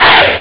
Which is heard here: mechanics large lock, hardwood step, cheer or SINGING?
cheer